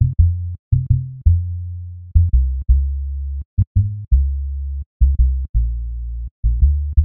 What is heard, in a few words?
BASS; multitracks